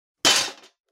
metal texture

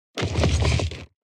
fall down

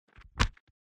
grabbing

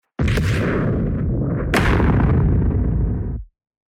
Superhero inbound

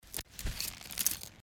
Reading bow

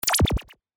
boomerang

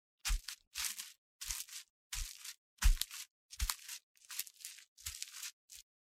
glass texture

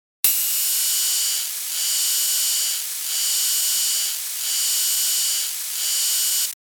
angle grinder

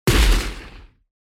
desert eagle

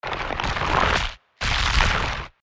sticky movement

